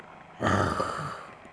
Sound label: Growl